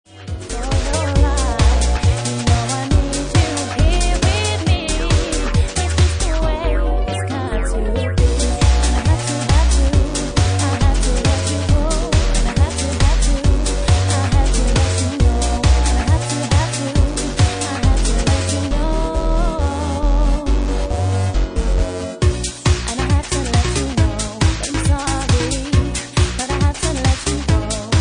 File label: Bassline; Bassline Meltdown Session 1